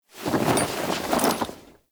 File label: Camp Canopy Flap; Quests; Veilbreaker; Kahl Camp